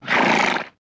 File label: Horse Whinnies Blows